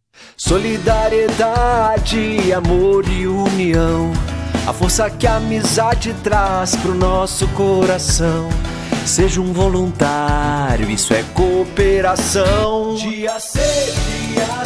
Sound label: Jingle